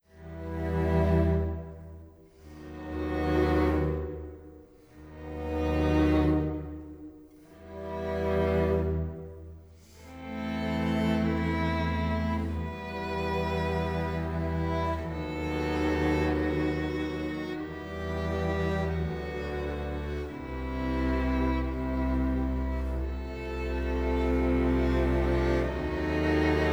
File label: String Quintet, Chamber Music; String Quintet I. Chamber Music III